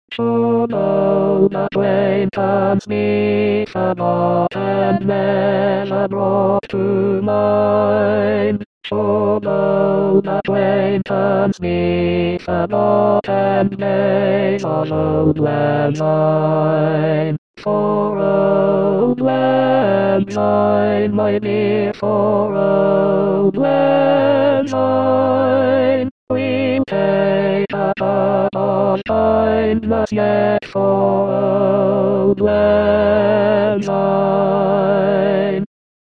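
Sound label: tenor; Auld Lang Syne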